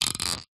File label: industrial clip spring loaded plastic open creak